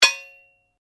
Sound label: Blade Heavy Impact, Sword; Foley sounds for melee weapons; Combat